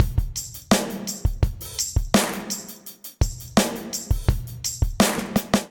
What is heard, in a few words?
Studio Kit groove 84 bpm, Drum; MIDI Clips; Memphis; Essentials; Ableton